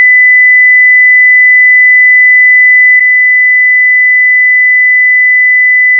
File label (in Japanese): 2000Hzの音データ/基準音と低減音[警報音など]; ペアガラス対応